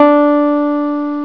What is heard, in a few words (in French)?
synthe; rats theatre